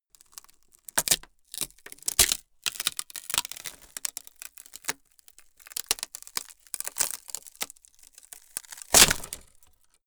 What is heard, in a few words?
wood splinter low